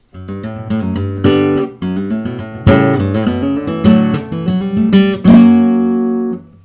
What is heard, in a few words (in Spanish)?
falseta